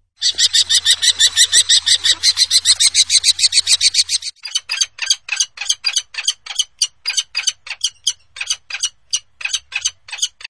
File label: Peregrine Falcon